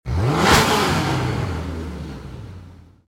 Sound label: vehicle